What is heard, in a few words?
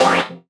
Collectibles Various FX; Cymatics; Video Game Sample Pack Vol 1